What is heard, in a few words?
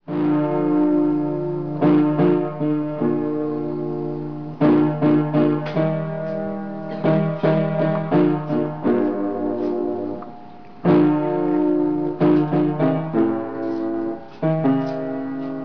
piano